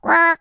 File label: piano c; sfx